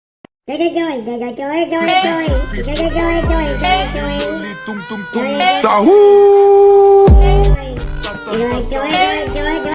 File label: funk drill; labubu; brainrot